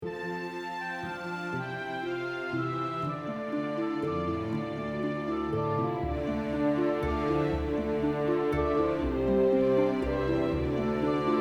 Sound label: scarborough fair karaoke, flute de pan